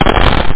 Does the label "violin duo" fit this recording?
No